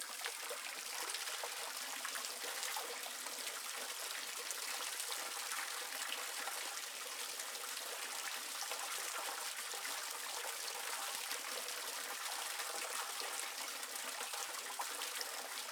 Small Metal Water Fountain Mic Placed Inside AmbiX B; fontanna ogrodowa